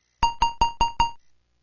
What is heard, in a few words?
Error; Othello